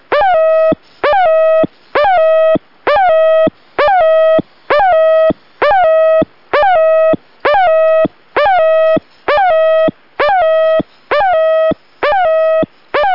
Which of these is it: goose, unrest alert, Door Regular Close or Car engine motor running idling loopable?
goose